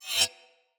Dagger Heavy Whoosh Wind Up Accent; Weapons; Player; Swords; Fey

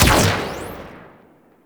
Shotgun Shot; Warframe; Venus; Venus Combat Spaceman Agent

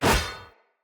Short Sword Whoosh; Weapons; Swords; Dendrit Short Sword

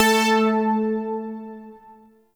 Shawzin Oct Two A; Music; Warframe; Zariman

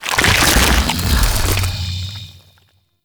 Vendor Emerge Fast; Warframe; Infested Eris

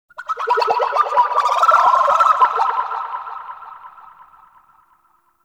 Ocarina; Warframe; Ambience; Nokko Colony

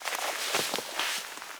Classroom Move Foley; Ambience; Warframe; TheNewWar; Zariman

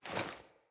Foley Action Default Sword Throw; Player

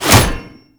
Shield And Sword Catch; Warframe; Grineer; Mortifor

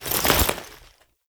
Foley Weapon Swap; Warframe; Characters; Kahl

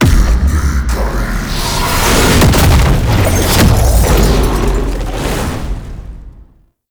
Intro Cinematic SFX; Warframe; Tau; Betrayer

